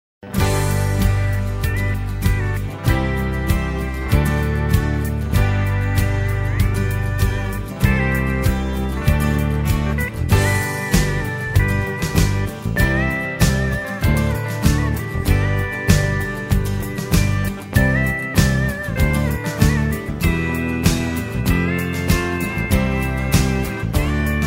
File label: LITE COUNTRY